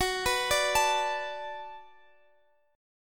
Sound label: D 6th Gb, chord